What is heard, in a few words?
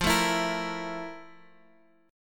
F Major 11th, Guitar, Drop D; 15,12,14,15,x,x; down; chord shape